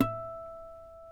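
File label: E2 HARMONIC, CLASSICAL GUITAR; Northstar - New Gold VOL-2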